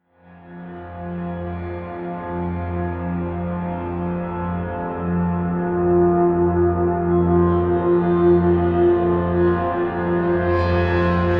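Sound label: majestic brightness, pads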